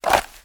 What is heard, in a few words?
gun draw holster